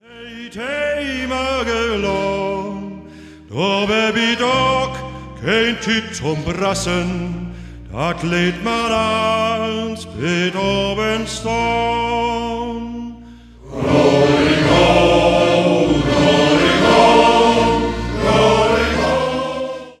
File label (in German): Shanty Chor